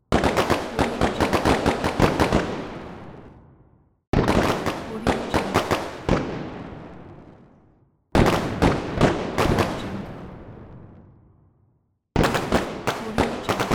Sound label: fireworks